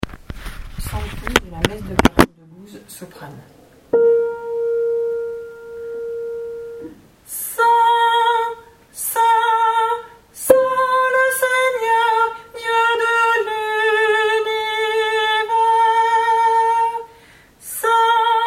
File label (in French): SOPRANE; Sanctus Messe de carême